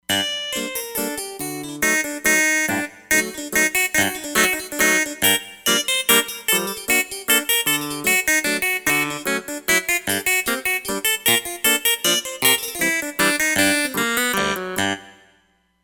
Harpsy Clavi

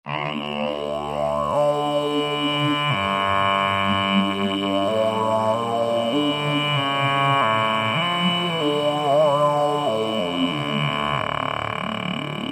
Indian Trip, Roland V-Synth

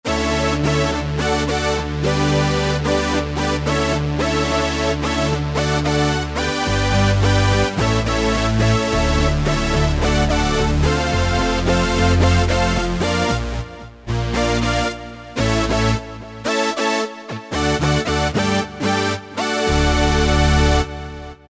OB Eight, Roland JP-8000; Factory Performances

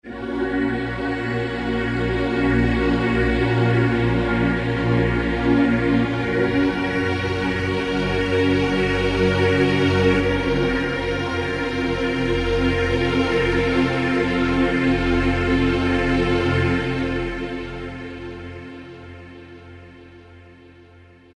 vox, E-mu PK-6; Luna'sWood

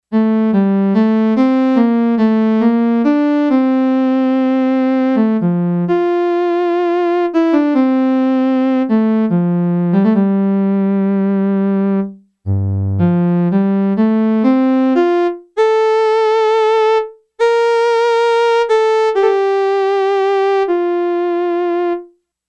Cello 1, Yamaha DX11; Factory Preset Voices

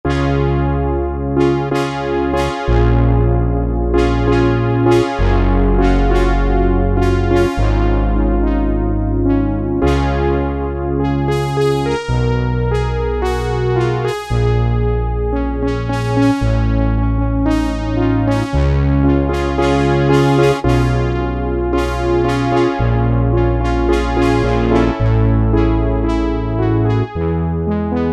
Analog, Korg T3

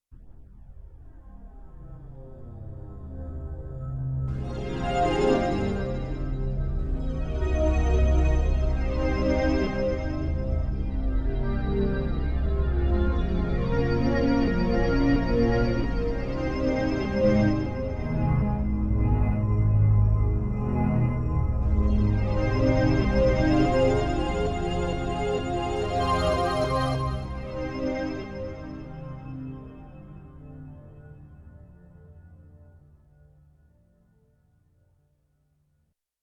Windham Hill Texture, Yamaha TX16W Strings

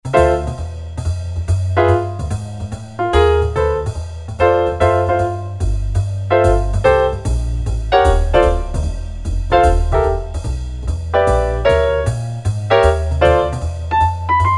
Piano

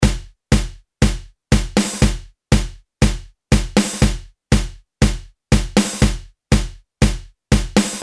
TANGO, Roland R-8

